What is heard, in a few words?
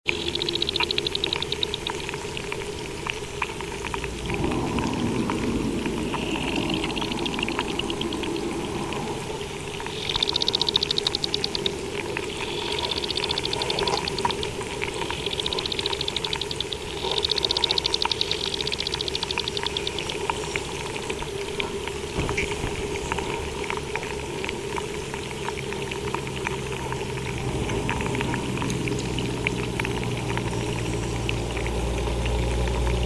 arborea lake shore near bridge, underwater